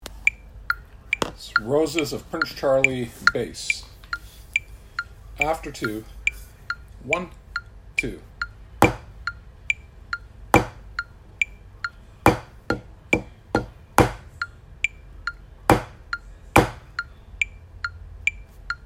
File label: Bass; Roses Prince Charlie